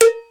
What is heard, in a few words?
Cow Bell